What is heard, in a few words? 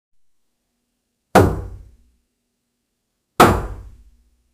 slamming your hand on the